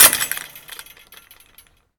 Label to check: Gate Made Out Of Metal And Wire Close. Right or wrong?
Right